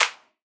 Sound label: Clap, Hip Hop Drums - One Shots